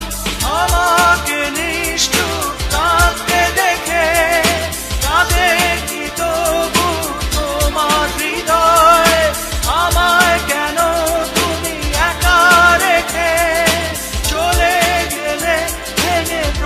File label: Bangla Song